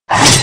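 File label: werewolf skill B; langren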